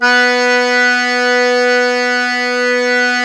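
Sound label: diatonic accordion B1; USB Soundscan vol.23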